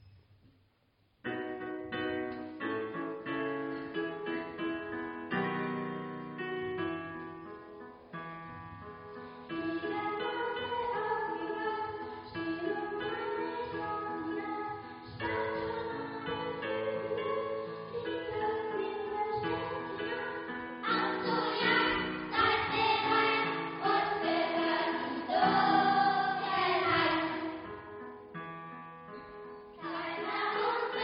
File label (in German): Fledermauschor